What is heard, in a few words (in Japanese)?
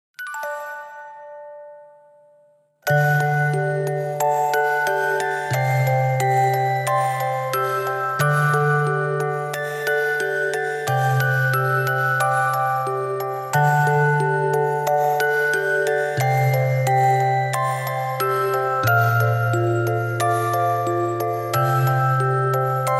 オルゴール; Fifthのテーマ; TWIN